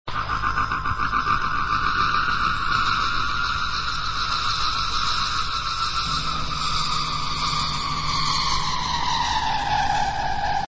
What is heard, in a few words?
Echoplex; reggae history